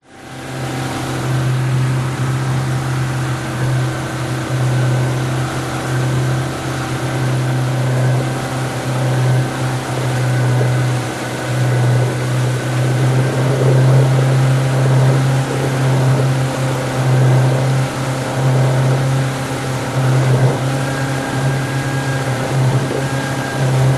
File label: transport motorboat outboard 16 ft fiberglass drive fast sea slightly choppy; near motor